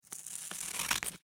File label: Office Scissors Cut Paper